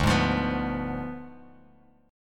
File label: D Diminished 7th D down Bass Standard; strummed